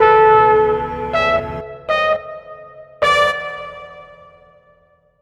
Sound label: Trumpet